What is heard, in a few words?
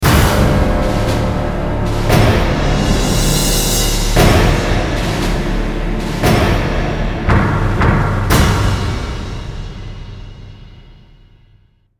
Cutscene Monks Cathedral; Infernal; xbox 360